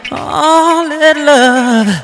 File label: spy laugh short; spy jackson